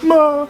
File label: death; spackenmobs; apored